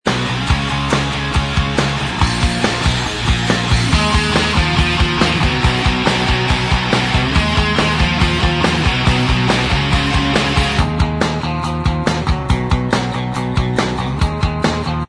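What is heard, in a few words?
Instrumental Track, backing tracks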